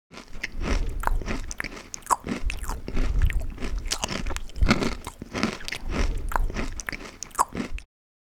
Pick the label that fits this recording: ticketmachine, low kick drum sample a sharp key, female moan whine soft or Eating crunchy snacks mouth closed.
Eating crunchy snacks mouth closed